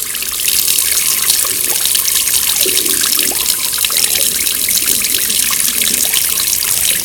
sink; Golden Crayon Sounds

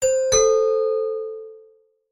doorbell; Golden Crayon Sounds

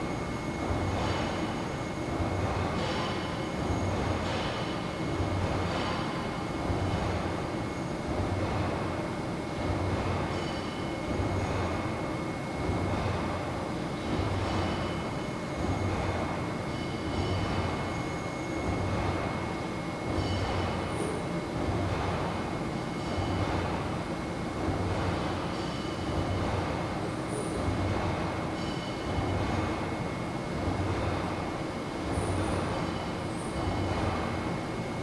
workshop; Golden Crayon Sounds

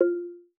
confirm; jbl; iOS 26

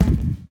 balloon land; Golden Crayon Sounds

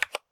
keyboard type; Golden Crayon Sounds

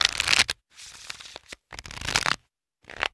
shuffle and deal; RS Games Sounds; Apples to Apples

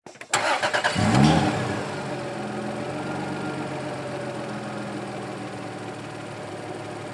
police car start ext; Original TK Sounds

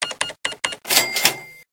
cash register; Golden Crayon Sounds

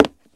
hardwood step; Golden Crayon Sounds

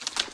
rope land; Golden Crayon Sounds

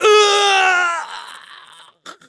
death; Original TK Sounds

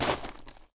rocks step; Golden Crayon Sounds

